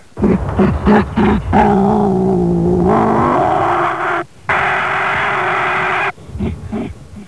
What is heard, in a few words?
noise